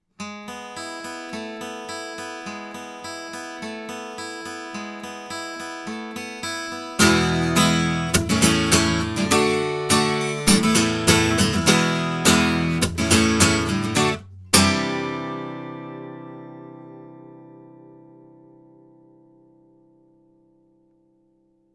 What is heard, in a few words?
TL66 guitar Gibson, microphones recordings; Pearl